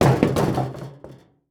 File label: Household Garbage Can Knock Over On Wood